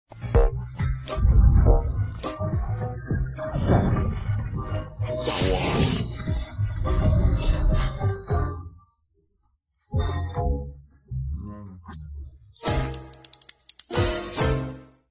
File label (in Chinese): Accompaniment, 每日坚果; 音MAD; 恰恰小黄袋; 洽洽小黄袋; 好看视频